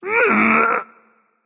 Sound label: tongue, voicepack; smite; jorm